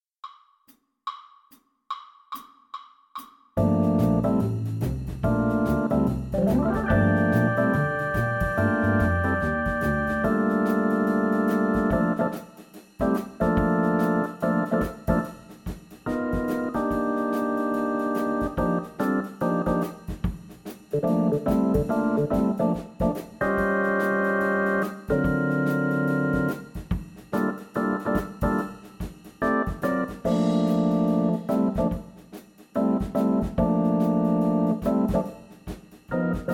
Bass PlayAlong SHUFFLE, Bass Trombone; Bass Trombone Etude A-1 Shuffle Track

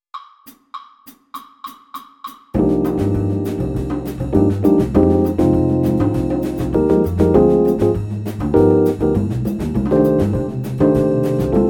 Tenor Sax Samba Play a Long, Jazz; All-State

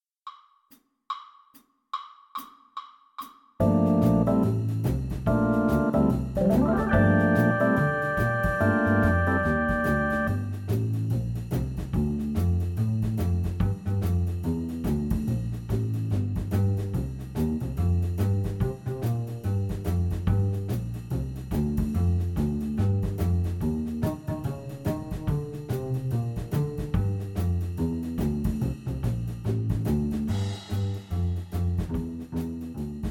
Piano Play Along SHUFFLE, Jazz; Piano Etude A-1 Shuffle Track

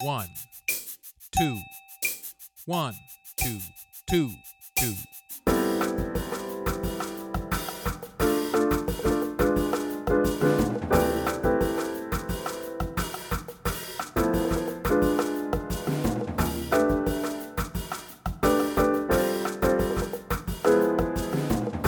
Bass Play a Long LATIN; Jazz-Band; All-State

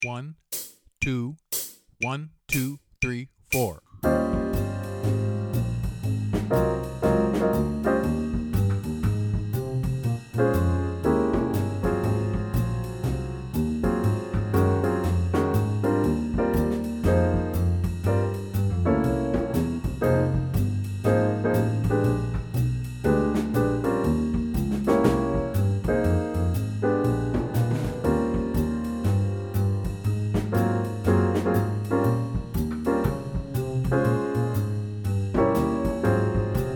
Vibes Play a Long BLUES; Jazz-Band; All-State

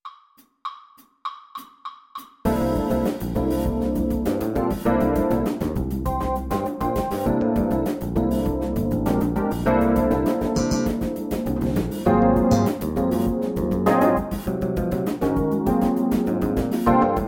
Bass Trombone Playalong FUNK; Bass Trombone Etude A-2 Funk Track; 2025 Nebraska All-State Jazz Band

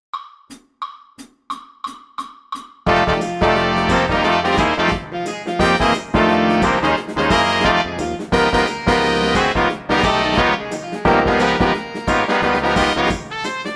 LEAD Trumpet PLAY ALONG 2nd LINE, Jazz; All-State; Jazz-Band

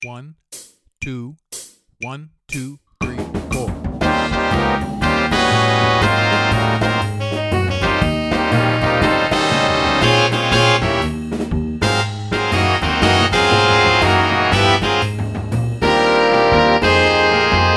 Trumpet LEAD Play a Long Etude BLUES; Jazz-Band; All-State

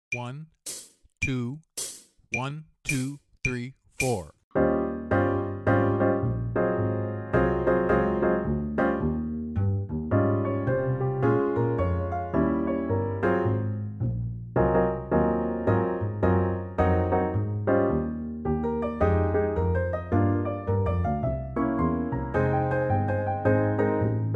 Drum Kit Play a Long Blues WITH CLICK; Jazz-Band; All-State

